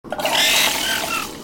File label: flushed